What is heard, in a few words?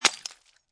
Glass Bottle With Liquid Break, Impact, Glass Beer Bottle, Liquid Inside Bottle, Break On Cement